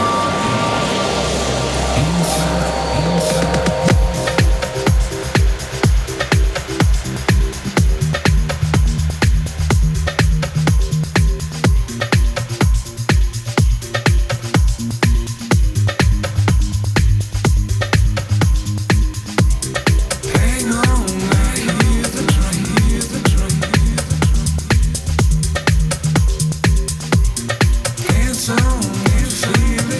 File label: Beat